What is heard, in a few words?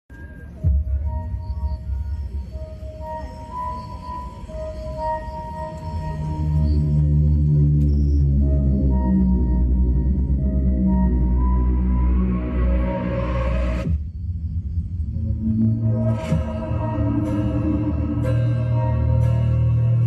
horror music ringtone; stree 2 title card background; dolby atmos